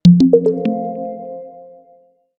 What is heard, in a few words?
notification; Oppo; F3 Plus